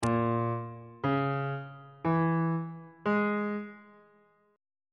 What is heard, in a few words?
g sharp harmonic minor 7th chord 2 is a sharp half diminished 7th on bass clef